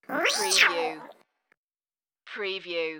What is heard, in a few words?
Cat Reaaarrr!